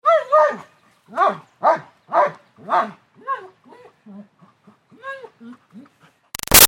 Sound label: Dog German Shepherd Barks Whiney; Dogs (Sound Effects:Animals); Big Room Sound